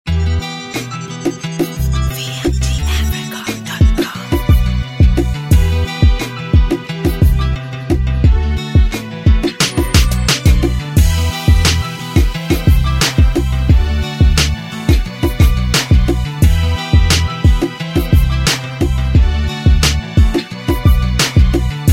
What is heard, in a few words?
Beat